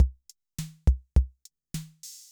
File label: jazz rock, Yamaha MR-10